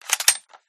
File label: an94 switch, guns; resurviv